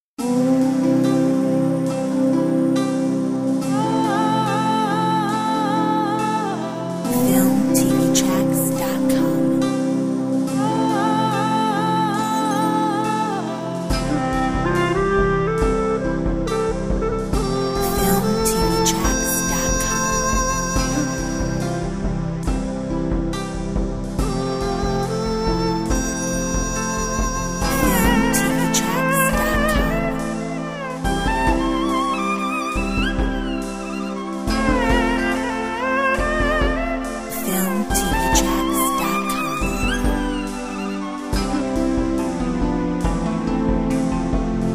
Chinese tradition

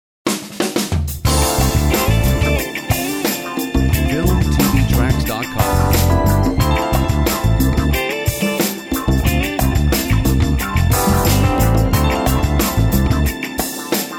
ballad

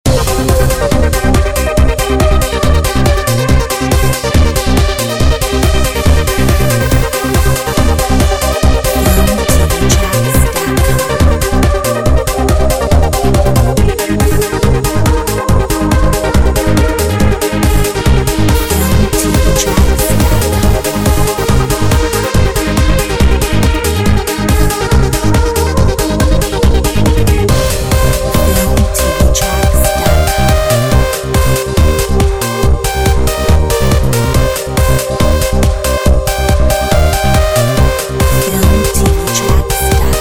Euro Techno

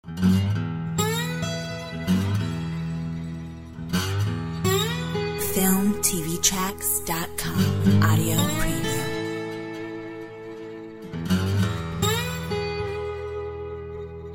blues

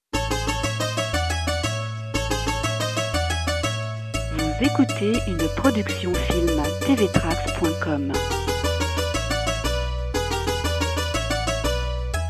Greek Party

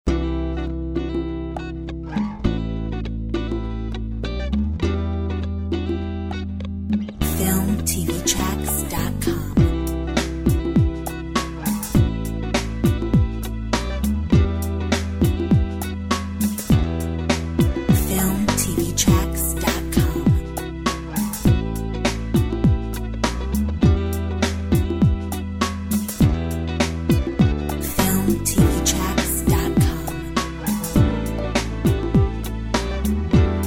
Soul funk

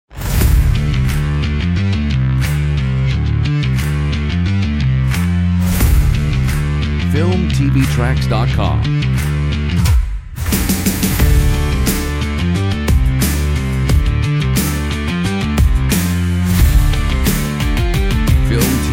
SPORT EPIC TRAILER

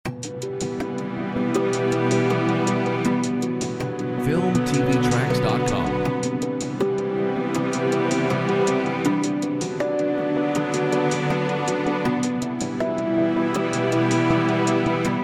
drums

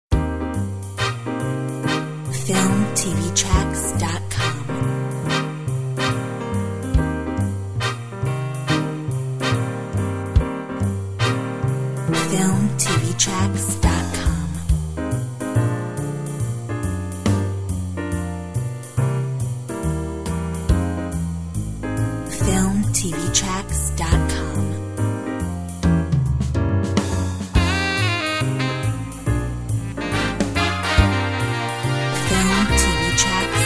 Good mood swing

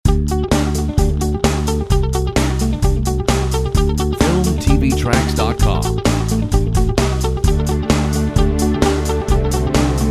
Rock Stellar

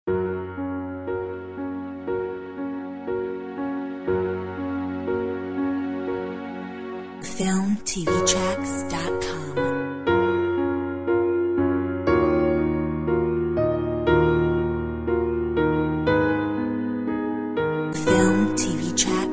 Emotional story

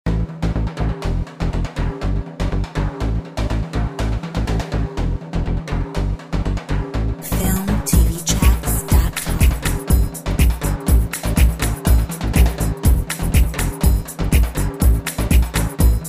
Brazil timba

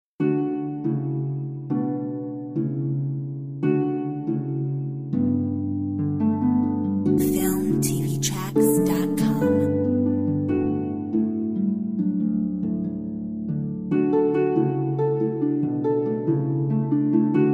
Early light Harp

